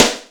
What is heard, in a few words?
YAMAHA R5+RX7, DRUMCOMPUTER; Retro Sampler